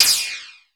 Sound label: TEK P, TECHNO, Drum Base; 90 sSampleCDs; Crypton - DrumBase5000